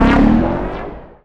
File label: Force Field Hit No Damage; Defiance